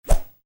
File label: Woosh, Whoosh: Short, Light